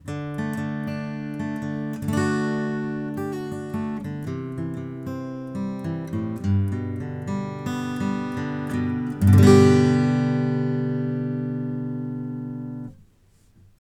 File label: AKG C417PP Acoustic Guitar 13cm Picking